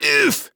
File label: Tesla hit